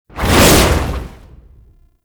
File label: Shield Drone Throw; Enemies; Abilities; Warframe; Tau; Dax Medic